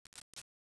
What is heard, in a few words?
sg552 bolt pull